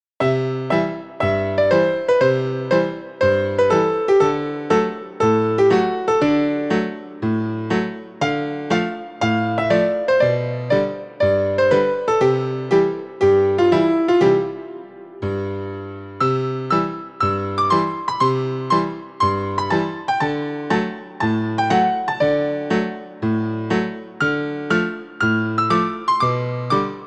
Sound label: Newage